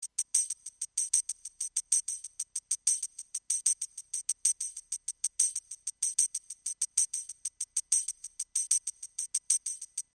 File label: beat BPM095